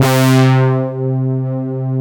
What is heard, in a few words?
DANCE SYNT08, Techno Trance; E-MU Formula 4000 Series Vol. 2